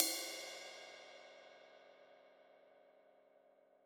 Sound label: Trash F, drum